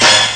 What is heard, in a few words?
yes chord, cartoon